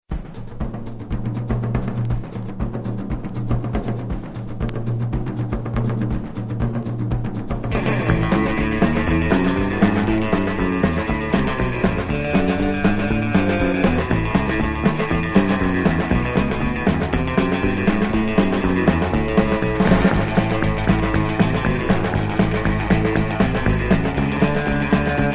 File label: black cat boogie